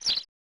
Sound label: Bird Call